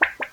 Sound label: Blip Blip